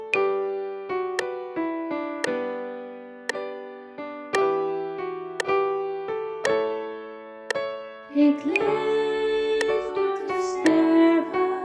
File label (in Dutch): Click Track voor het laatste refrein Melodie partij